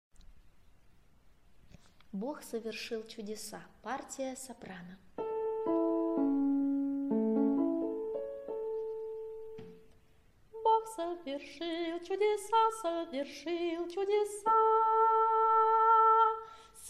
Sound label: soprano; Bog sovershil chudesa